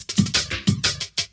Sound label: LOOP, Drum & Bass, 180 BPM